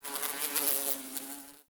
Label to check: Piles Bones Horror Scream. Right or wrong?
Wrong